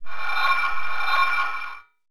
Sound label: PERCUSSN, Ambient, Drum